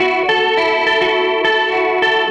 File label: Gretsch Guitar Loop